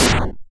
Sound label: explode